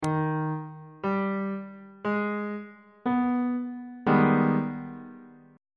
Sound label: g sharp minor major 7th 2nd inversion on bass clef